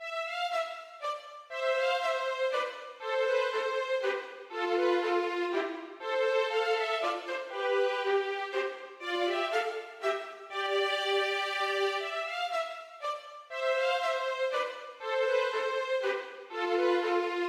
Menuet for fiolinduett; Aamodt

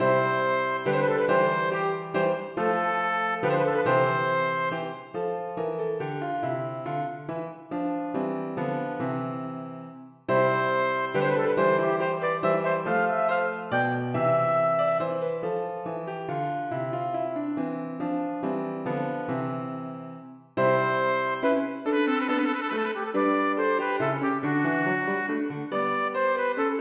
Menuet for trompet og piano; Aamodt

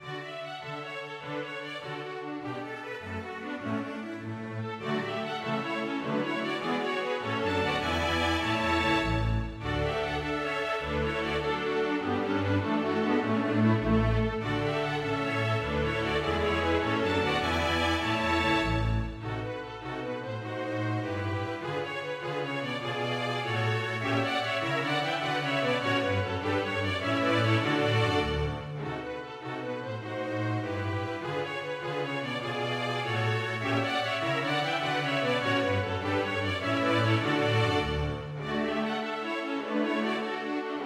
Gigue for strykekvintett

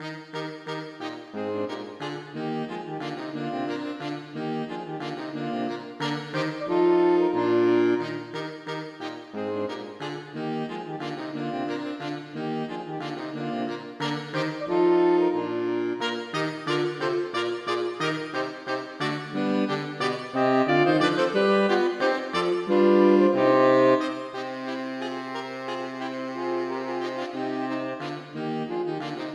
Paspied for saksofonkvartett; Aamodt

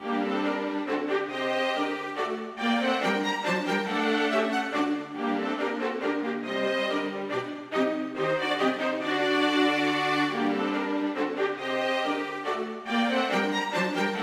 Menuet - for strykekvartett; Aamodt; Madam Schel

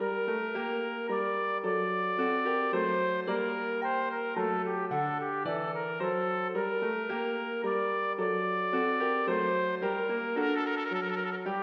Menuet for trompet og piano; Aamodt

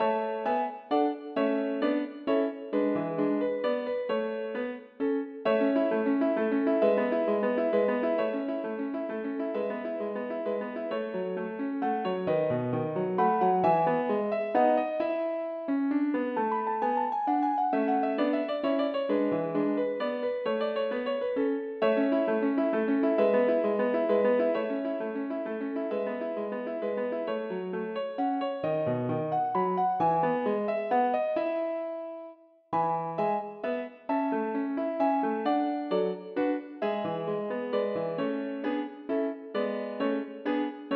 Menuet for piano

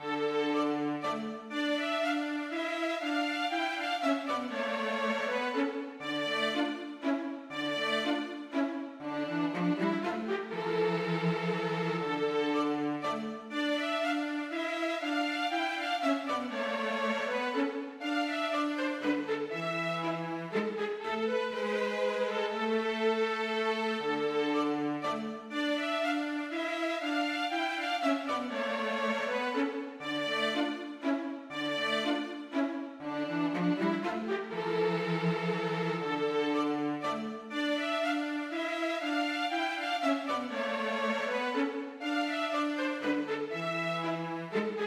Menuet for fiolin og cello; Aamodt

